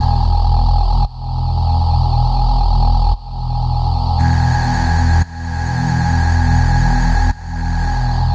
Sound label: E Bass Electric Motor, 115; Zero-G; Future Wave